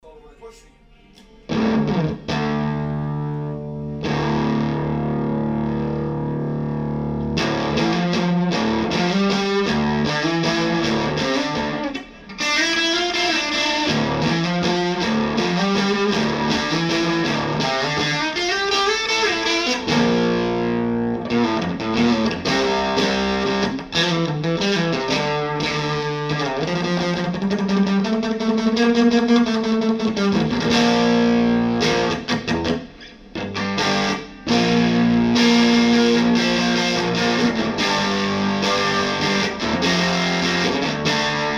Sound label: Metallic; NYAmpShow